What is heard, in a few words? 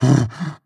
breath short, zombie